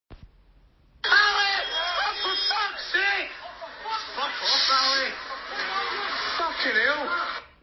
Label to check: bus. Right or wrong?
Right